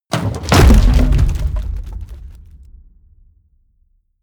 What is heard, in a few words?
hit wood, hits impacts; whooshes rises